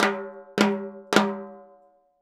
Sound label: Timbali, Latin Percussion